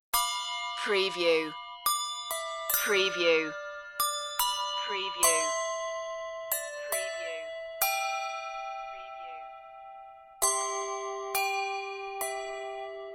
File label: silent night handbells